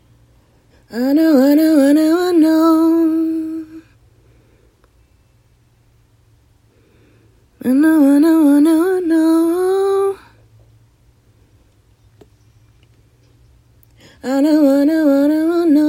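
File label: pop 75 A flat minor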